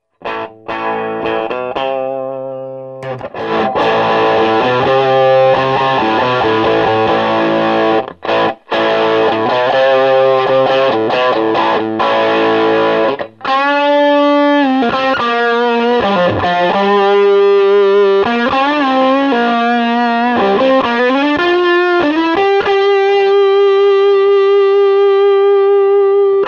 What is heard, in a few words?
FUZZ, guitar